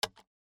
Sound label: PC Keyboard Space